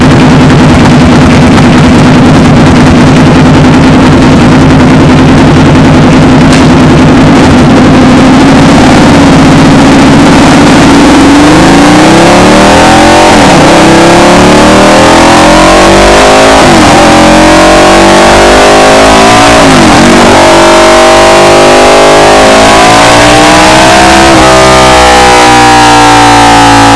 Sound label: pipe sound